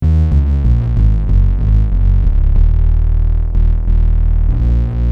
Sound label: bass